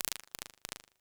Chorus Taps; Experimental; Ableton; Convolution Reverb

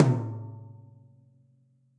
Tom Mid WarmAndLow; drum hits; Ableton; Factory Packs; Session Drums Club; Standard Hits

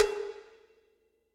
Cowbell Big DarkPlateVerb; Drum Hits; Ableton; Factory Packs; Session Drums Club; Standard Hits

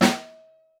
Snare Room; Ableton Foundation FreePacks; Snare Designer

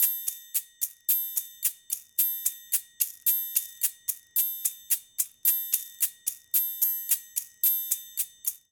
Ballad Shaker Triangle 110bpm; Latin Percussion; Ableton; Factory Packs

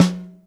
SN Top, Snare; Ableton Foundation FreePacks; Snare Designer

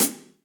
Hihat Closed Ambient Loud Punch; Drum Hits; Session Drums Studio; MPE Hits

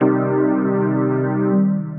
Cerebral Washout, Pads; Ableton; Skitter and Step

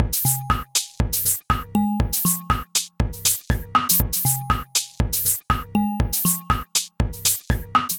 Playful Kit, Drums; Ableton; Punch and Tilt

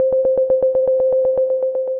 Glass Chimes, Synth Rhythmic

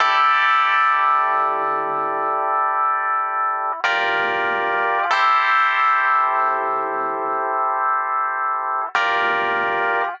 Tempo Wah Clav Chords D Minor 94 bpm; MIDI Clips; Accompaniment; Ableton; Factory Packs; Chop and Swing

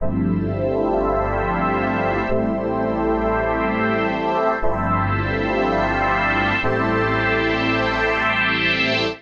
Pad (G) 104bpm; Music Loops; Tropos Pad; Ableton; Factory Packs; Singularities

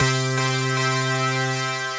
Keys, Synth Keys; Naked Keys

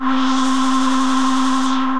Dark State, Ambient & Evolving; Wavetable; Ableton; Factory Packs; Synth Essentials

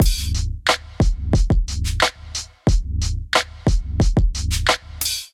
Kit groove 90 bpm, Drums; MIDI Clips; Peace; Ableton; Factory Packs; Chop and Swing

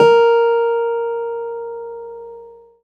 A#3, Keyboards; Ableton Foundation FreePacks; Model-C BD

